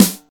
natural snare drum sound g key, snare single hit tuned to the G note